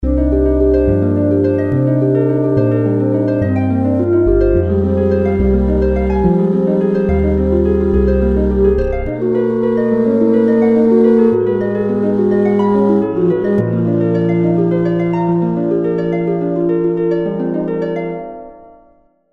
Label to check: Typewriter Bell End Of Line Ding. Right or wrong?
Wrong